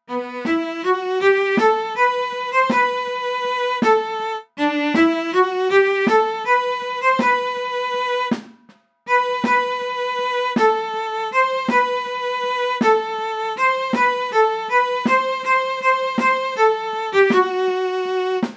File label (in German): midi 1-stimmig; der alte wanderbursche